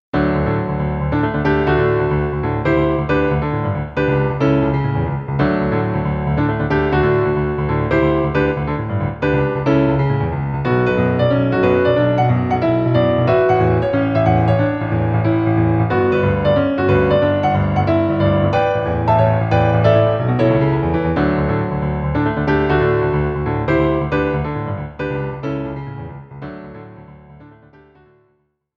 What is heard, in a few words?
Boss Clear, piano; DARIUS